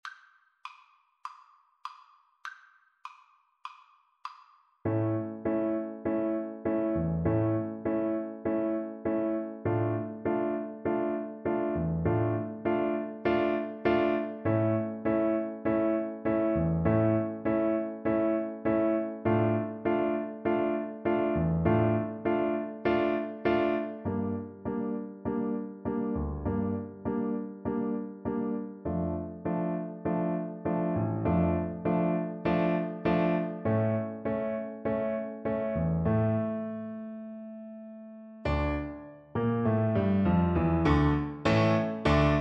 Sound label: FL kar, Flute